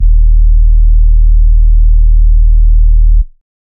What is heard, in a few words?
Sub D#, Dubstep; Bass One Shots; Ghosthack; Dubstep Sound Arsenal; 50 Bass One Shots